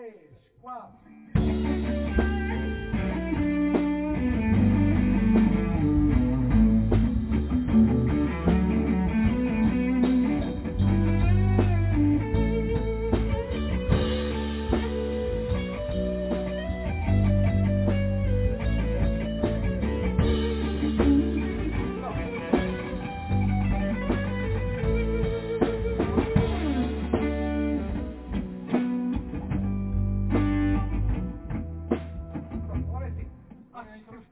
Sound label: solo